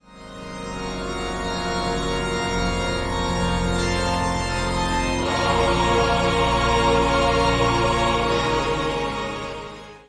organ

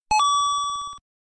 coin noise

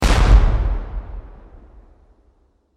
civil war cannon shot with long r

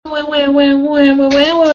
crying

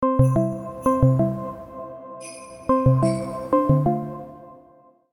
call calling sound; discord

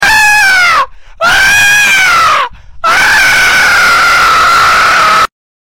aaaaah

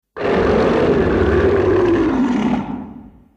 long growl; godzilla 1984

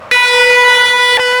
horn sound; trainz bb400 verte voie

